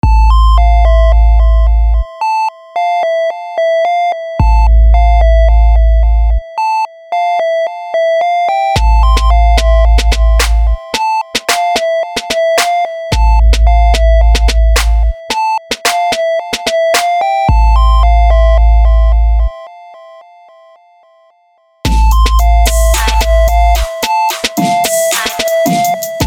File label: Low bass